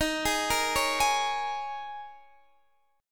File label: D sharp 7th Suspended 4th, Guitar, Open D